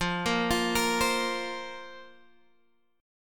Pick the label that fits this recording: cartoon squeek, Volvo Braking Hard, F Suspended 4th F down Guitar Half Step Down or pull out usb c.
F Suspended 4th F down Guitar Half Step Down